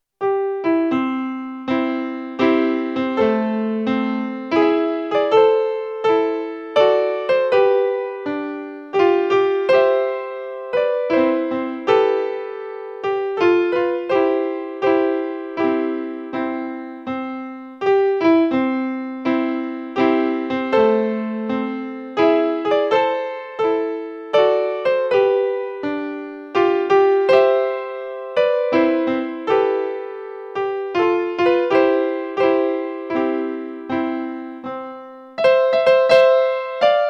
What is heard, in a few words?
american anthem accompaniment